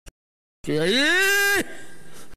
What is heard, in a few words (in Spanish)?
grito